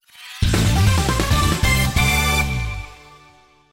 All Clear; dengeki bunko fighting climax

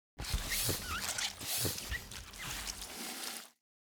Toad Rubs Jar; yesterday origins gamerip 2016 switch ps4 pc ios macos

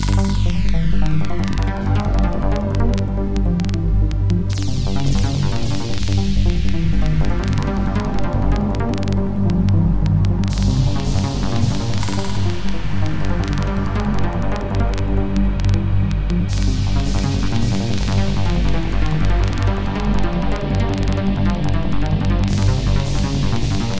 alarm; Hard Truck Apocalypse; Ex Machina

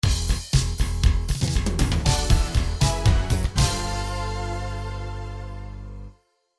After Combat BGM (TG-100 Version); Asuka 120% Burning Fest.; x68000

